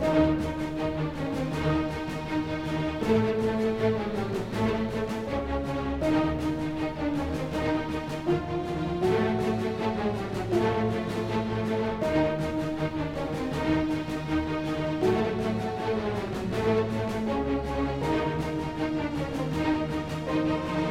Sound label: Music Fight Cold Theme Easy 160bpm Loop; asterix obelix slap them all 2